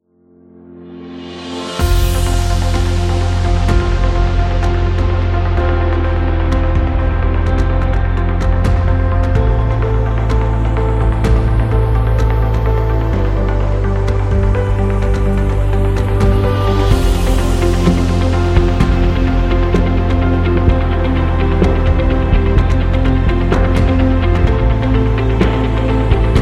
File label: Corporate Rock; technology